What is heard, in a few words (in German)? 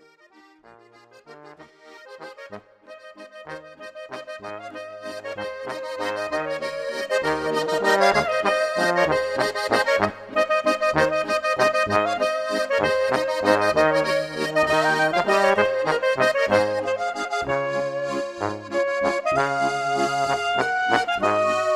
Salzburger Landler